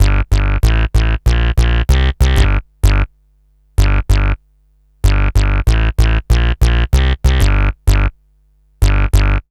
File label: bass, Roots Funk; USB Soundscan vol.41; AKAI